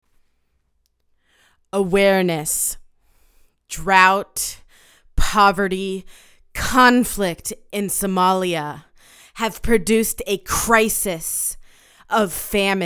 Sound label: Vocals; Hunger in Somalia Awareness Project